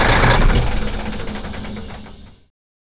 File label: turnoff, vehicles; frghtlnrargosy; tdmcars